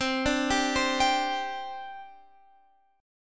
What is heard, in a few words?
C Suspended 2nd, C, down, Guitar, Standard; Csus2 arpeggiated; capo=3